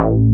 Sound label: JP4 BASS 16C; E-MU Formula 4000 Series Vol. 2; Orbit Presets